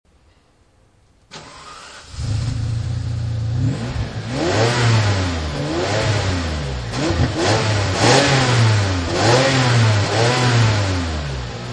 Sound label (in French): Ferrari 456 GT point mort